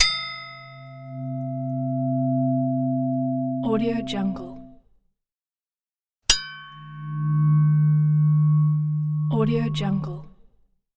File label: buddhist bell